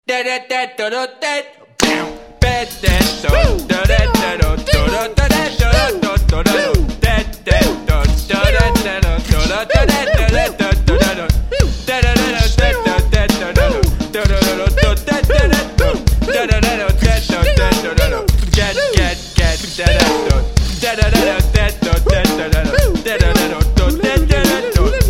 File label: Bbox